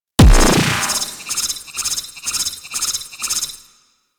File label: Focus Boost Appear; Warframe